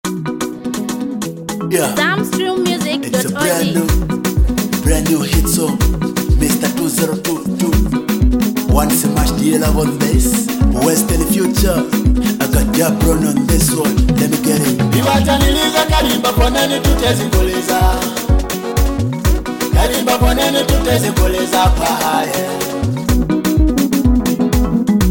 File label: Kalimba Collection